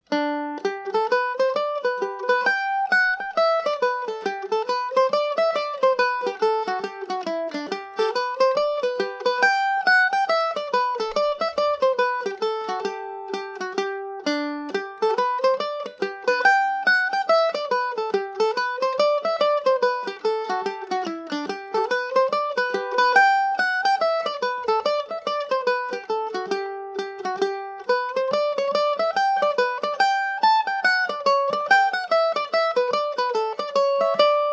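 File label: G major, irish mandolin; hennessys hornpipe; G&O #34 mandolin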